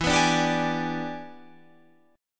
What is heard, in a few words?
E 7th Sharp 9th, chord